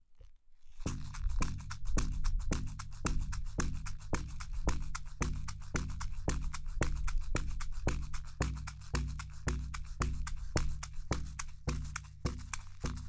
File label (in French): maillochet